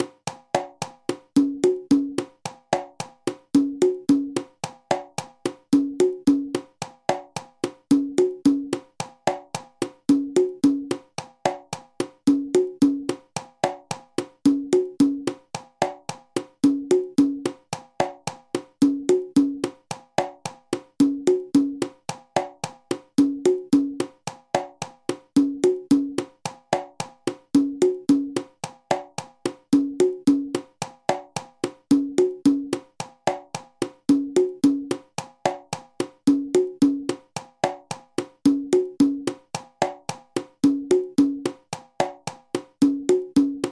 bolero cubain congas

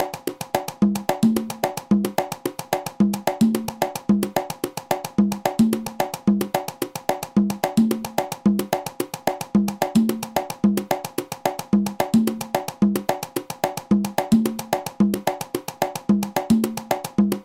conga

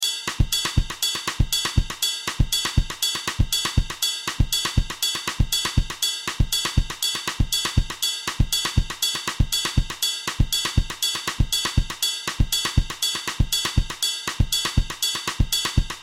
songo batterie

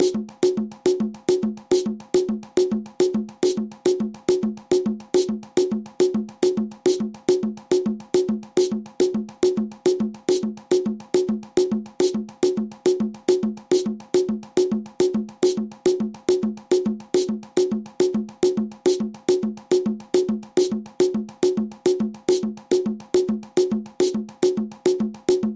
abakua havane binkome

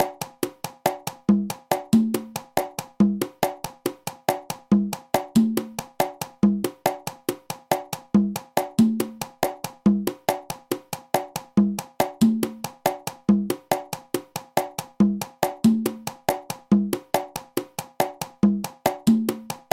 conga lent

